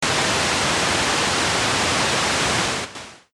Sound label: noise